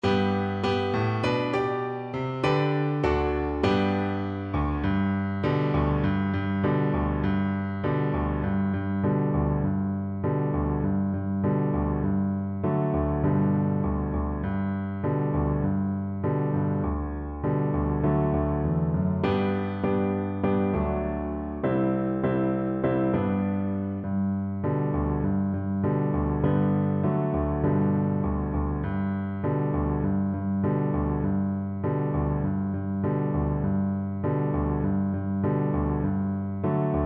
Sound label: labe igi orombo, Viola